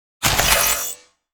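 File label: tripmine explosion digital; weapons; Half Life Alyx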